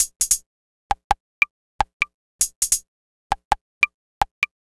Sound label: Hi-Hat Loop, Drums, Dance Beats